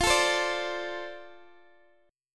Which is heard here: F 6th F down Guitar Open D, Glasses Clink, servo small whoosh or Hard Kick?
F 6th F down Guitar Open D